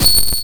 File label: coin; Blokos